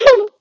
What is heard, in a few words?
Football head poke recover Sfx